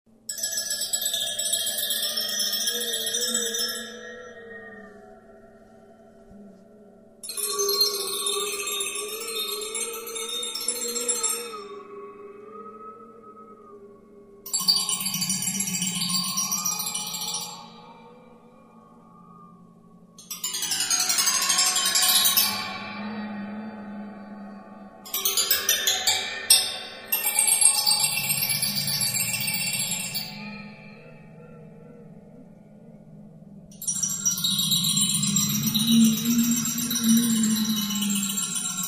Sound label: Waterphone, Draht